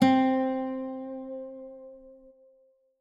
guitar note C

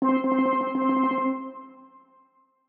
steelpan note C

